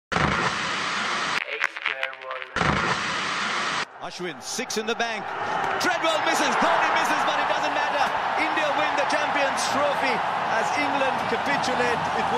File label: Cricket Anthem; INDIA